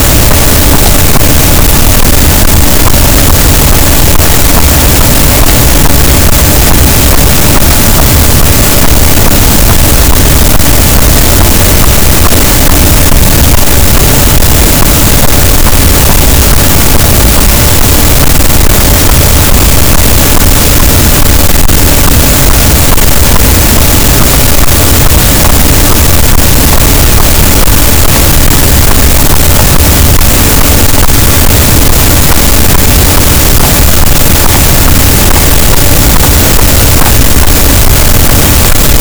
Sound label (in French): cachalot, Physeter Macrocephalus, gaussian noise